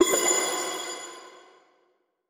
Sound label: Coin Hit; We Are the Dwarves